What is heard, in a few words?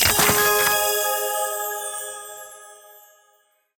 bonus Pick Multiplier; secrets of christmas